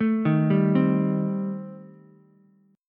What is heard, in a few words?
Eb 6th Flat 5th, Ukulele; Eb6b5 arpeggiated; JGuitar